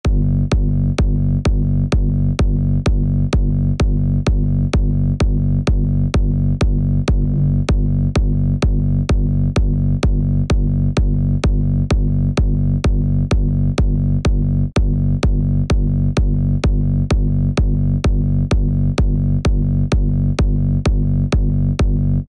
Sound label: Minimal techno